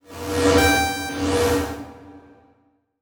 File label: Transition Sweep Laser; Production Element